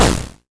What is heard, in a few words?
explo; js-twin-shooter